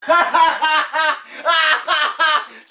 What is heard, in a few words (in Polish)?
śmiech